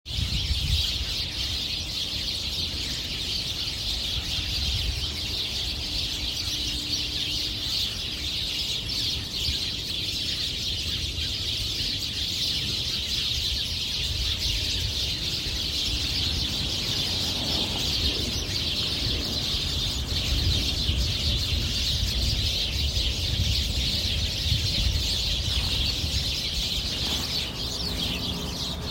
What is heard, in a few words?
hedge filled to the brim with sparrows; chicago usa